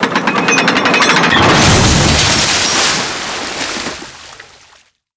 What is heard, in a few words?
gate